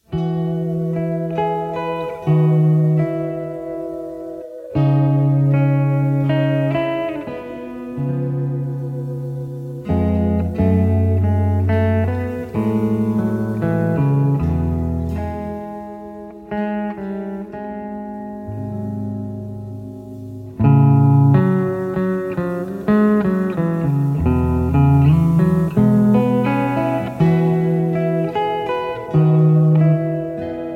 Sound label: Etudes For Piano